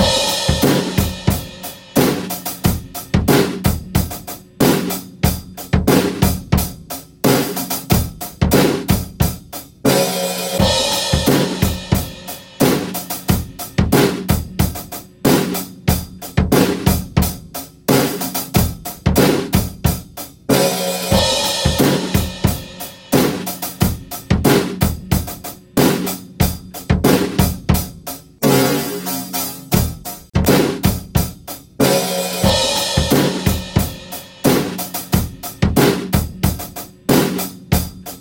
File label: drums